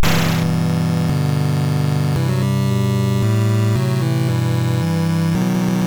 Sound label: game over; 8 eyes